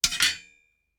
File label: Plate